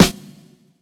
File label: Snares